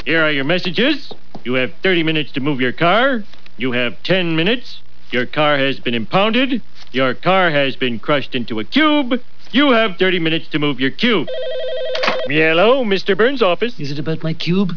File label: carcube